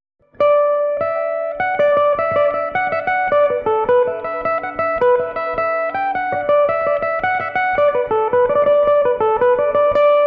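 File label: D major, Irish mandolin; leg of the duck; belmuse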